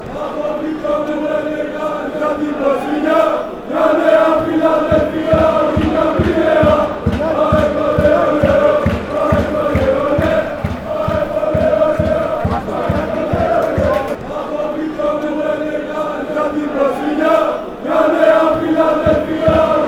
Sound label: chant; aek athens